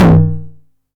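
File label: 909 mid tom tape saturation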